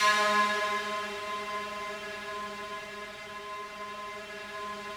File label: FOG ATTACK, Yamaha VP-1; Music Store; 90's Sample CDs; FOGATTACK 3